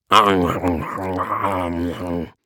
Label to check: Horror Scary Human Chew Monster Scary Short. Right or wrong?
Right